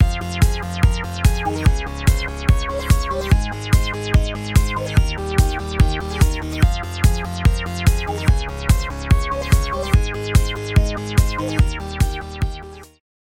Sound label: BASS Resonance Bass; Synthractive; syntheway